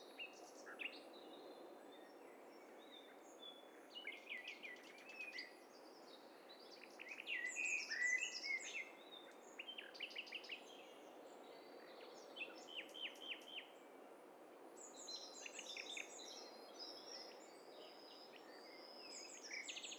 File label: STEREO BIRD COCKTAIL PARTY